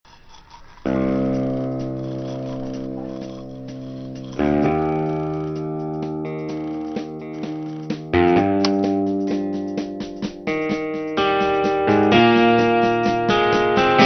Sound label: Brush Rock; Vodafone